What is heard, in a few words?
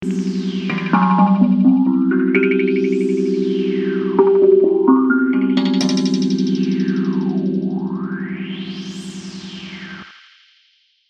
hapi drum akebono e scale with filter can effect